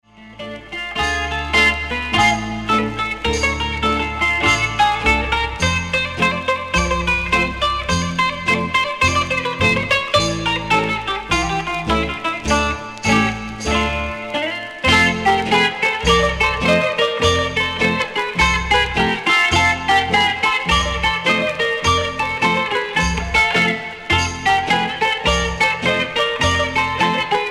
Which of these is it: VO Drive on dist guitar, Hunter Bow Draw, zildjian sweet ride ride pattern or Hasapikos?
Hasapikos